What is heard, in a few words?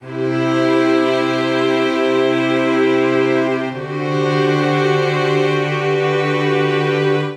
Progression IV-iii V-vi-viisus4 G Major 130 bpm, String Quartet; MIDI Clips; Mercy; Ableton; Factory Packs; Spitfire Audio